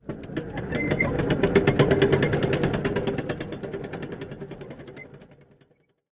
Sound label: Drawbridge Raise